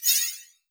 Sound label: Mage Shot